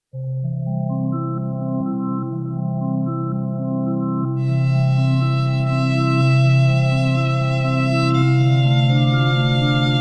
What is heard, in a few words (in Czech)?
Ave Gounod Varhany; housle a varhany